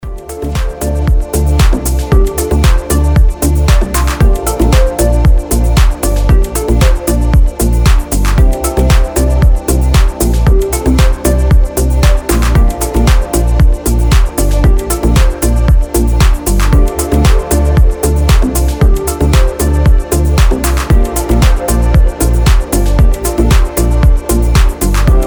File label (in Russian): osvezausaa melodia; osvizhajucha ta energijna melodija